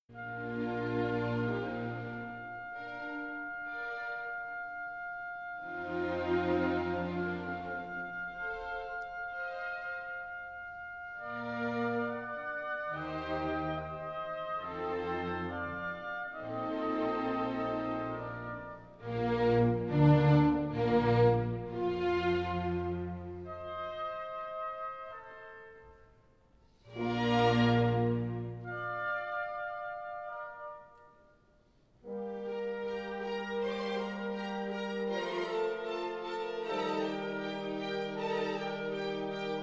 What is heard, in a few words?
Concertos for Piano; Sondeckis; Mozart